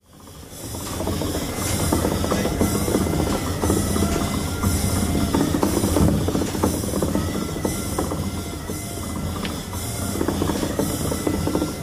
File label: Small Steam Pump, W Metallic Machine Rumble, Gas Hiss, Pneumatic Whine In Background.